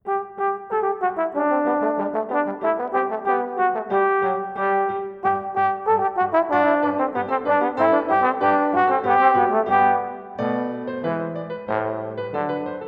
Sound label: Ding Dong Merrily